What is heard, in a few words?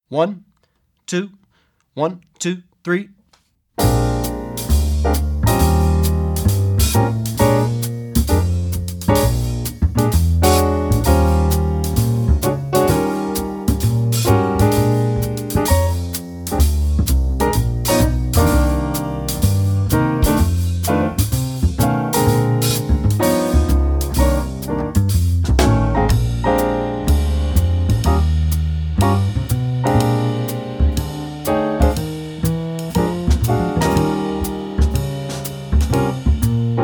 (Without Solo